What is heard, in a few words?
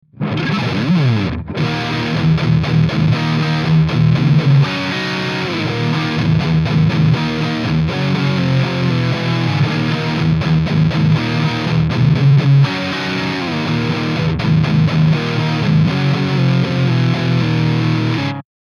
mesa triple rectifier mega distortion; Typical metal riff using 4ths, 5ths & more